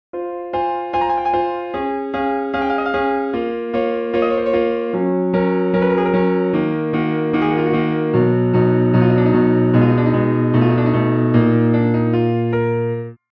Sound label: Scarlatti meantone